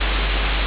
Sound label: ambience room tone